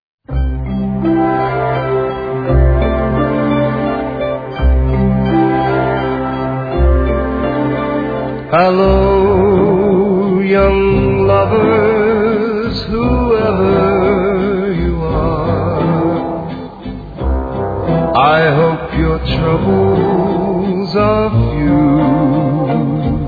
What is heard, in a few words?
Waltz, 29 bpm